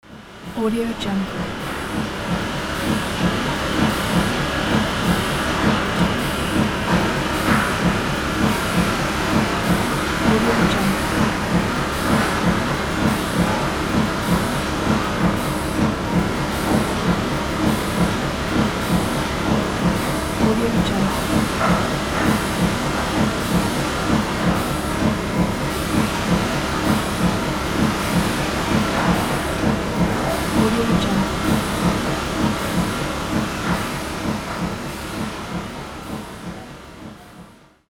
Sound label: industrial machines factory hall